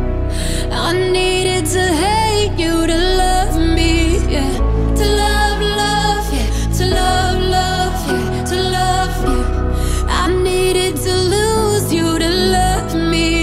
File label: English Ringtone